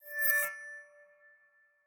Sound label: Erase